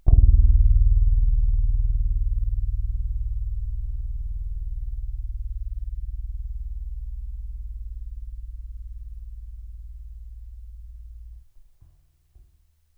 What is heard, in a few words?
Octave Zero tuned E0